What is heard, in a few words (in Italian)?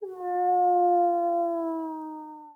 Lupo; BLIND QUEST - The Enchanted Castle